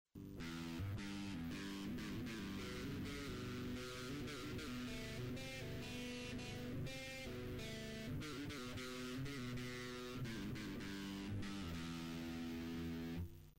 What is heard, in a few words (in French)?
Gamme de Blues en Mi (grave), Guitare